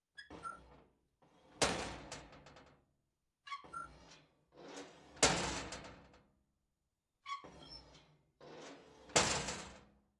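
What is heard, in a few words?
ext bulkhead door open close close med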